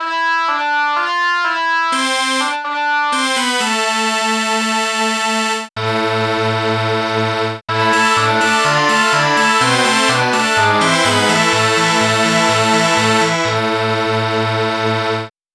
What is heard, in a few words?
bagpipe